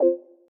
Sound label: drums conga high; Just Dance 2018